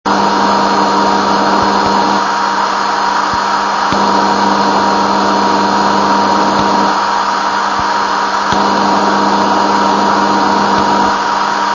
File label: noise; noisebuster